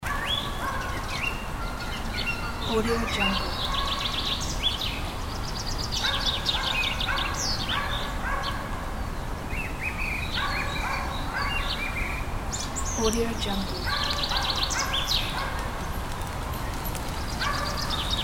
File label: park environment